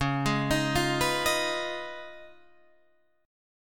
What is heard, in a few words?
Db 9th, Guitar, Open D